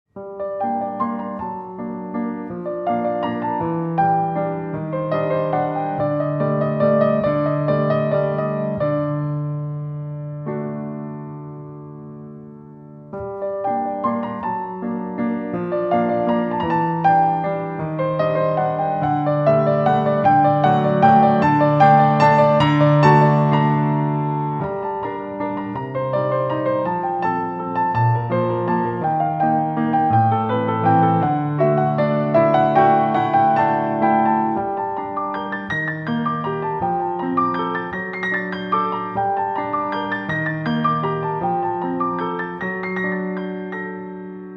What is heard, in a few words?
vivaldi variation piano; melodi ve rahatlatici zil sesleri